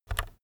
keyboard external key press very fast soft

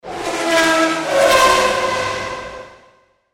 f1 pass by

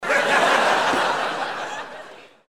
laugh table

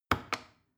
plastic button small click heavy slow

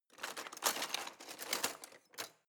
knives wood drawer grab

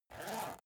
zipper use short

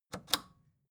record player power click off slow

